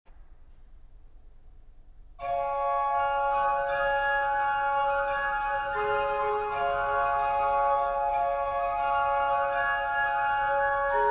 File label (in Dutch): improvisatie, kerk; oosthuizen